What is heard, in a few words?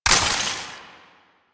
Ammo Pickup Shock; Ammo Ability; Warframe; Warframes; Frumentarius